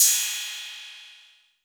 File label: Roland 808 CY; Drums; Tr-808